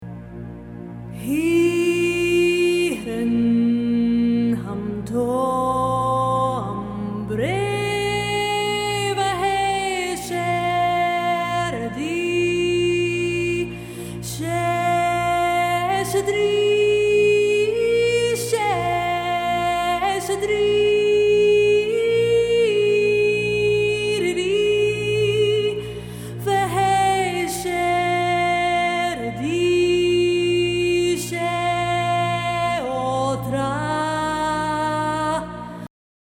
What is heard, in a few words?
bards lament voice